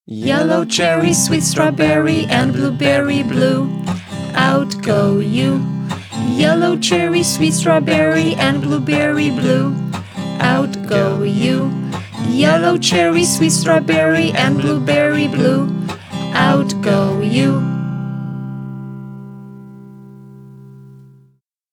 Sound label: yellow cherry sweet strawberry piosenka